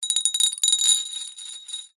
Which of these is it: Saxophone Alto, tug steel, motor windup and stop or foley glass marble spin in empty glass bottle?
foley glass marble spin in empty glass bottle